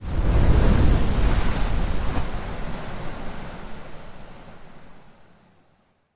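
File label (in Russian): отчетливый приглушенный звук тушения крупного пожара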